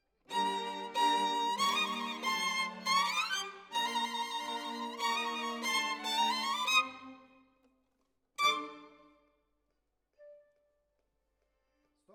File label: Violin Solo